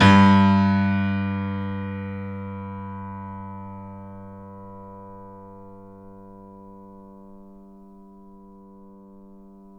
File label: FF G 1, Grand Piano off mic; AKAI S6000 CD-ROM - Volume 2